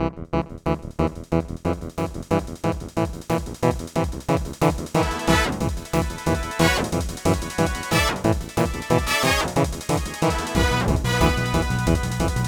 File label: battle